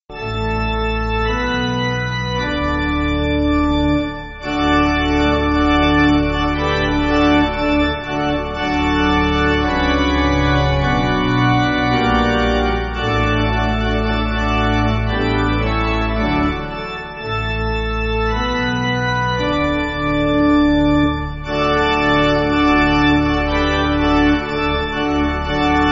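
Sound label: Pipe Organ, church backing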